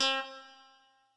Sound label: Guitar